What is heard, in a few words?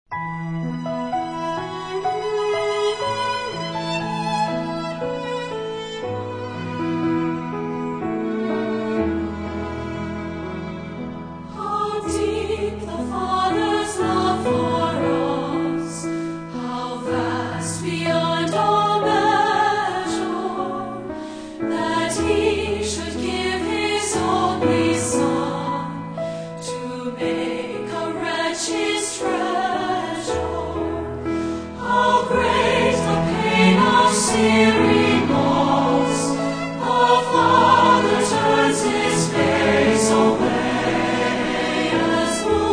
Singers And Orchestra